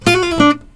ag alert, acoustic guitar fx

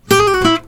ag alert, acoustic guitar fx

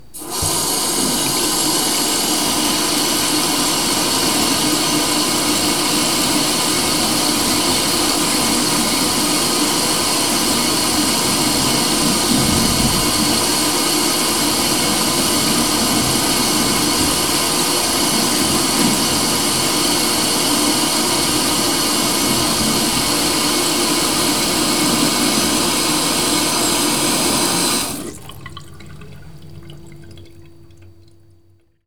water, ambient sound in the house

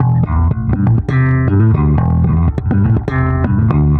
bass slap; manabu murai works

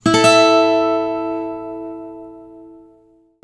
acoustic guitar fx

